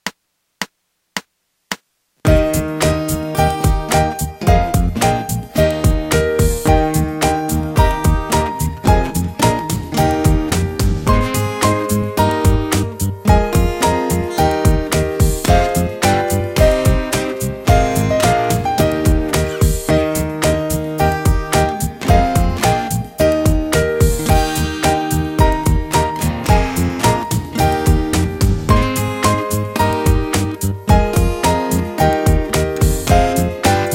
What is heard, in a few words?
The up down song karaoke; CP Anglais